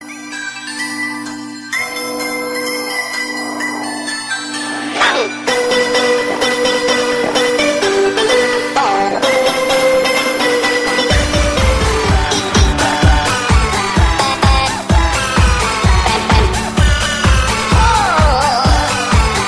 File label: Jingle Bell